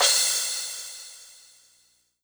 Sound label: Ensoniq SQ-1 Crash Cymbal